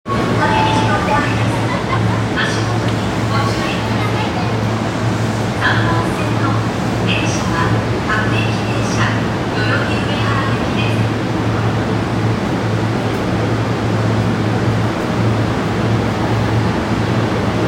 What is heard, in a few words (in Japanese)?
千代田線国会議事堂前西